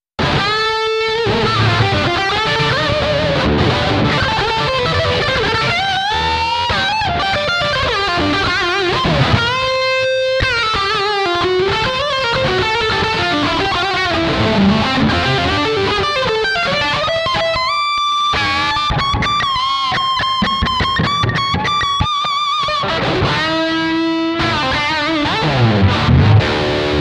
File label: lead mode; lead mode 3